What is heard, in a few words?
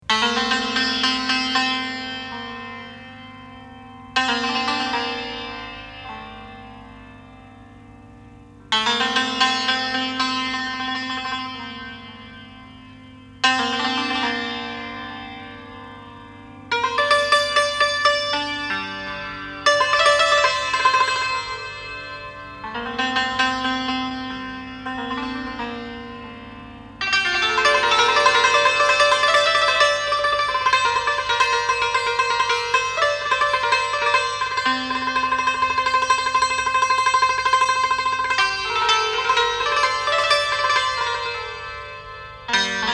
Taknavaziye Santoor